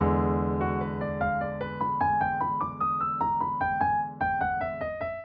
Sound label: blues